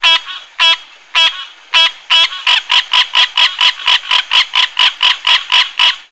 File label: Frog Green Tree Croak